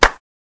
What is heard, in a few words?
Victory Clap; smite; kumbhakarna